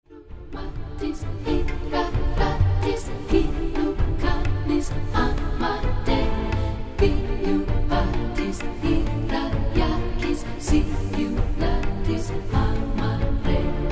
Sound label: Cantus Song of Invocation